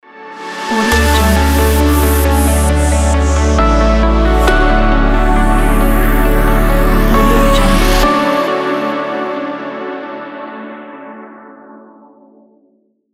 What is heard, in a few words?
clear mindset logo